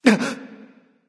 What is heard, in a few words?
surprise; smite; voicepack; rama